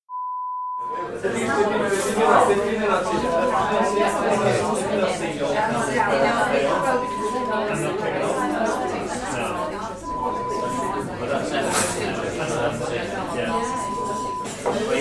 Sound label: Cafe sound